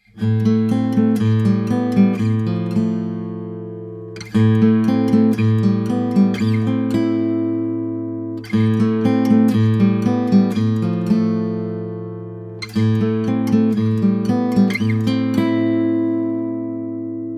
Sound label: guit; lct 540 s